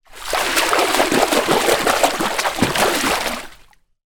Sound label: shark splash surface water feeding shake head tearing food; animals